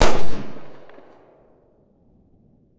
single gunshot with explosive reverberant tail

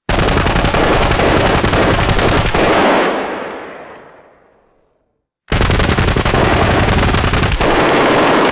distant automatic assault rifle bursts; guns

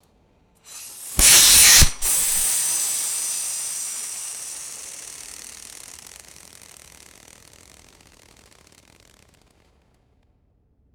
spinning pinwheel firework hissing and crackling

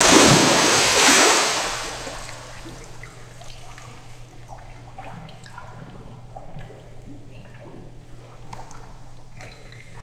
heavy swimming pool water splash

